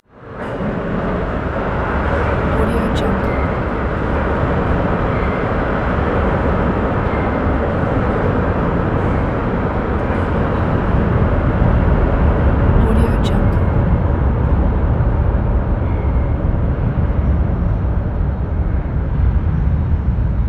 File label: train overpass under bridge